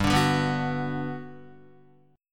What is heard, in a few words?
G Major 7th, G, down, Guitar, GM7 chord; strummed; JGuitar